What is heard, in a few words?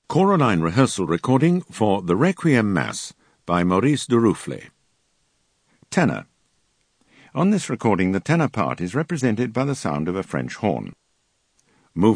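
Ten, tenor; Durufle Requiem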